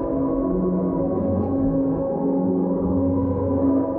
Tonal Re Draw, sparse soundscape

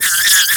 EXCITED, anime sound fx, comic

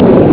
bongo claps; Amiga Soundtracker sample

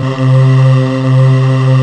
MED VOICE, EMU; Rubber Chicken Software Sample Bank

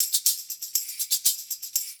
120 PERC

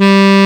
BRASS G2

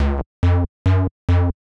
Sq Bass Riff Db F Gb, 140bpm; Zero-G Sound Sense Grime Scene; Construction Kits; Astoria

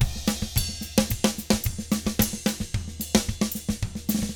SMBA, 110 SAMBA, Drums; ILIO; Double Platinum Drums 1

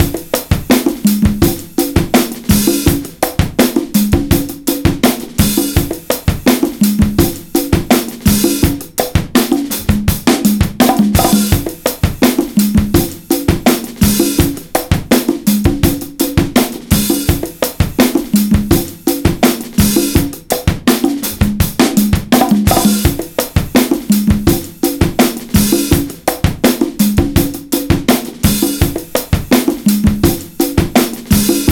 RARE GROOVE 83.2 bpm; Planet of the Breaks

